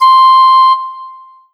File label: Roland SC-88 Piccolo C6